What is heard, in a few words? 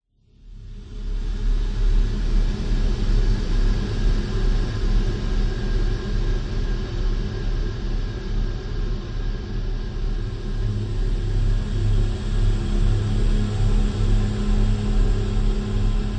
horror atmpsohere dark droning humming sinister evil; zapsplat